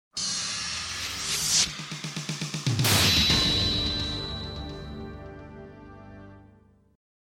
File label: Logo (Sega CD Version); Wolf Team